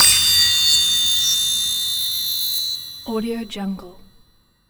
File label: high pitched banshee accents; sinematic 2; soundmorph